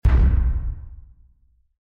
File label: impact hit